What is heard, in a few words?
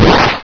firing